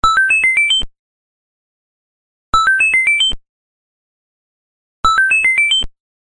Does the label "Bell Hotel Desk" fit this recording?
No